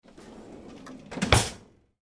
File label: household drawer cutlery close; Cutlery Drawer Close ( Kitchen ); Drawers; McKinney Sound